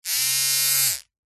household electric trimmer hair very short burst buzz